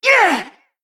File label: Female Val Kyr Attack